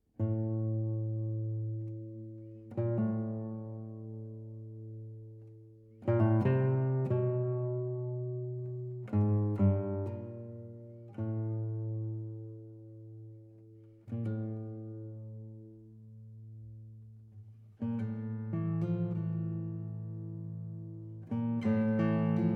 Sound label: Guitar Solo